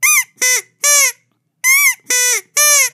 "Yay!"; SFX; 7rystan's 600+ FREE EDM SAMPLES & DRUMS

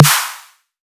snare; base pack; Ganimed

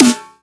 Snare; 7rystan's 600+ FREE EDM SAMPLES & DRUMS; Percussion

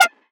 Synth; 7rystan's 700+ FREE COLOSSAL EDM SAMPLE PACK; SFX

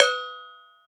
Cowbell

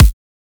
kick clip; drums; base pack

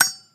High pitch metal dink; Foley; SFX; 7rystan's 600+ FREE EDM SAMPLES & DRUMS

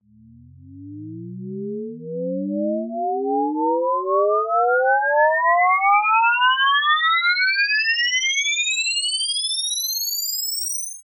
riser; base pack; BOBA

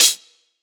Hat

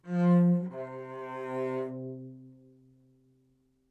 live string phrases, string phrase Cmaj 100bpm

